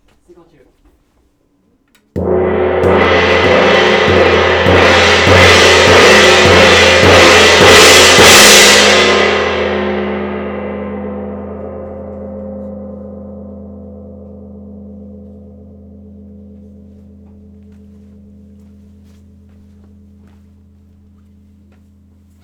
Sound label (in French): Petit multi, GONG VIBRATION